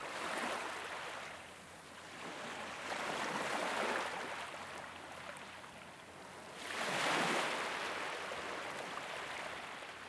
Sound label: Beach; UltimateFishing